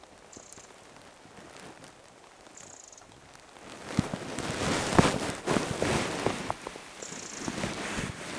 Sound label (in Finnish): nuoren peukaloisen lähihuomioääntä; ehka juuri sama lintu huomioaanteli myos tavallisesti; ilm nuoren peukaloisen lahihuomioaanta